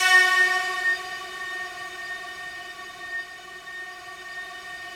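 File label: FOG ATTACK F#3 Left, Yamaha VP-1; Music Store; 90's Sample CDs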